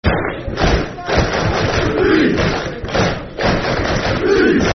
Leeds (Clap), Football Chant (Soccer Song); fanchants; Leeds Lufc

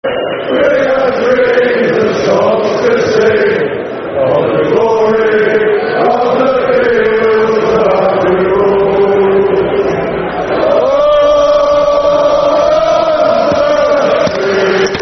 Football Chants (Soccer Songs); Fields Of Anfield Road; Liverpool Lfc

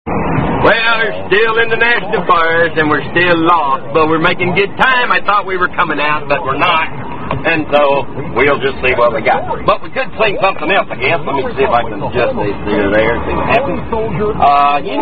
Unknown Nonsense Song; ''life Is A Rock But The Radio Rolled Me''

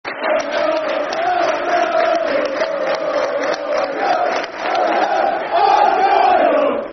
Argyle (Fast), Football Chants (Soccer Songs); Plymouth Argyle Pafc

